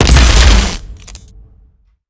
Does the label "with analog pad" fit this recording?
No